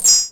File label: Chimes; SNES; Young Merlin